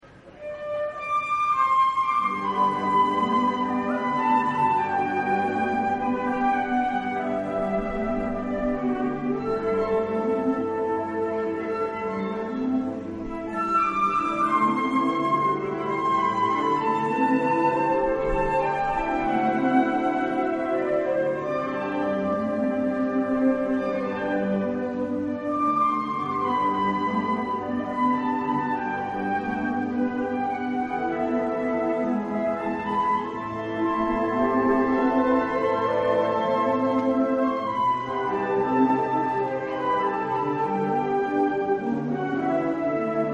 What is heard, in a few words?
concert, flute